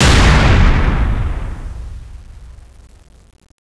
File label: hegrenade, weapons; czero opforce